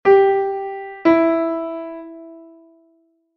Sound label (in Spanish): 3ª Menor Descendente Sol3 Mi3; intervalos musicales; ¿Qué intervalo suena?